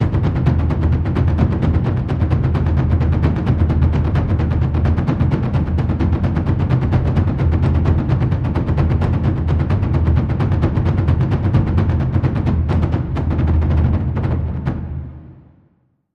Loop Layer Taiko

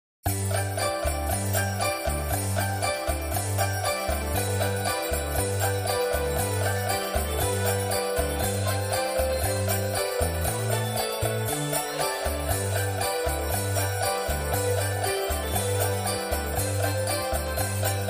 Jingle Bells

